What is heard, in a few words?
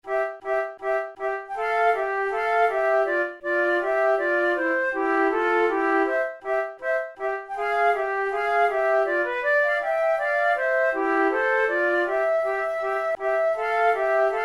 duet, flute